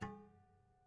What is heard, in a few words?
Prepared Piano